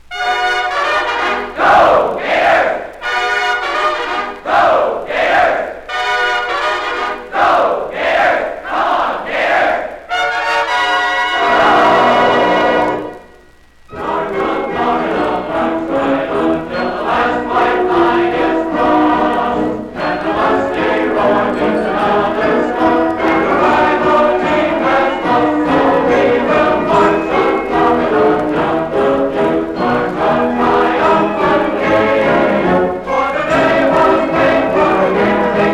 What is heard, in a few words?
Go Gators Yell; University Of Florida Songs